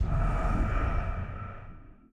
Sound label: energy Meter Start Up; elements